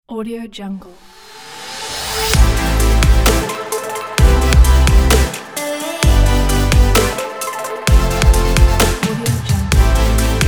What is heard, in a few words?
future bass logo ident